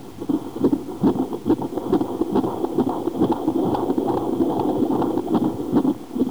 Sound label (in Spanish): Latidos, Bebe